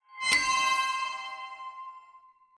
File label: ui quest cup medium